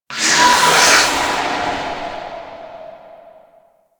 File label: Stream Blast E; Warframe; Ambience; Grineer; Grn Hanger